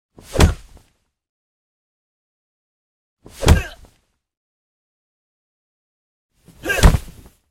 Kick With Vocals; Hollywood Edge Martial Arts and Human Impacts

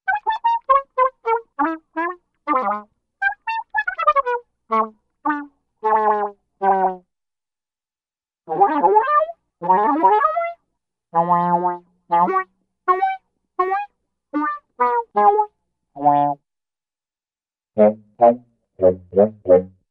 English Horn Talking & Playing; Hollywood Edge Cartoon Trax

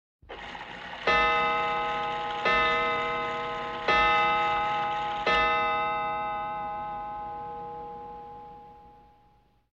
Chiming Clock; 100 sound effects

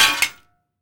IRONWARE, IRON WARE HIT; 1000fx

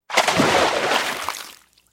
Body Falls Into Water With Splash; Fights Bodyfalls

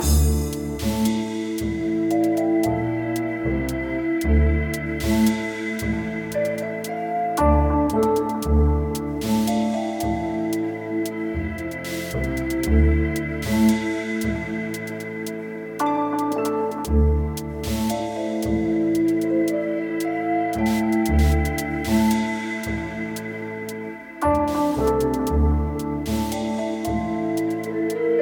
Talk Over Me, music beds

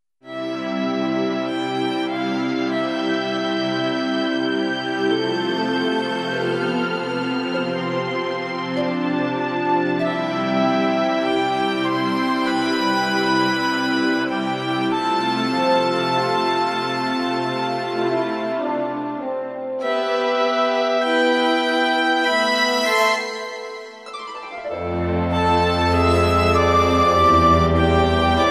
Wind & Orchestra

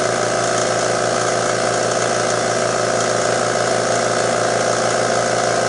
compressor, industry; 1000fx

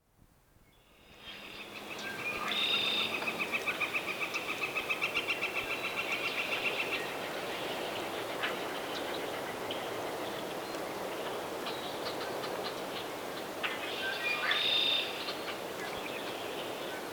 Springtime In a Pine Forest; Dr. Sound Effects; 210 Nature Sounds - 20 Hours of Relaxing Natural Ambiences for Meditation and Sleep

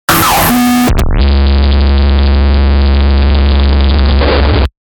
Amp Died; Best Of N2O Library

